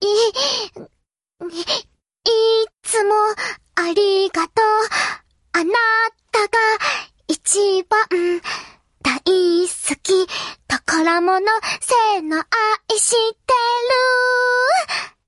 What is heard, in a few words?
singing; lyria